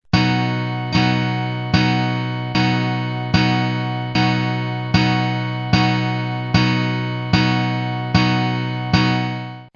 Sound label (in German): b dur akkord